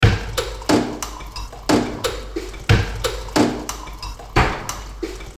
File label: Beats